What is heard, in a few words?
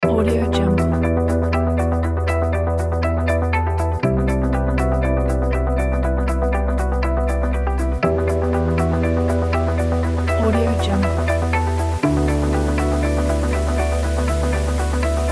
ambient corporate; ambient corporate kit